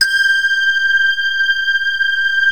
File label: STEAMPAD G5; M1+D50